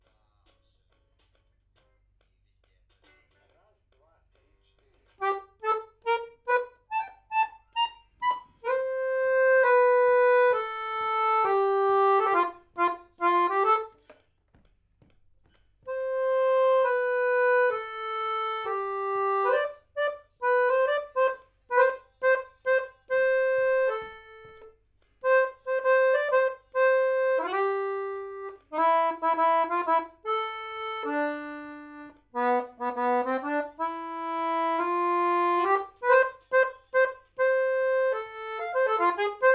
Accordeon

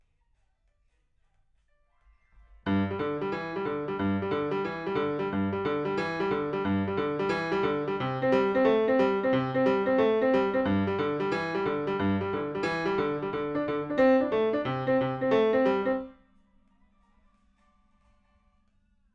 PIANO, RNR for piano

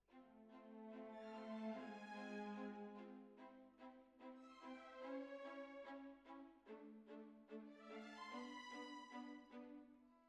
Oboe

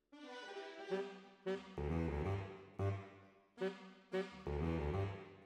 Alto, RNR for piano

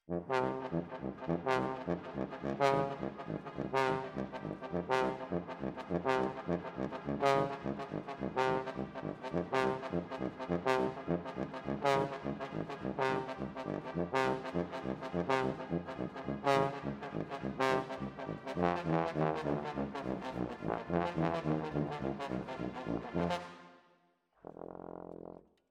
Tromb ASS

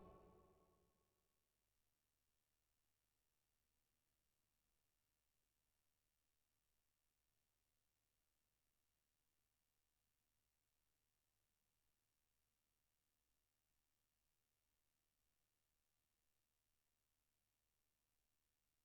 Keys

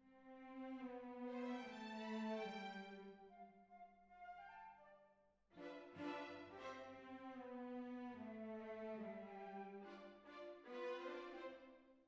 Cbasses

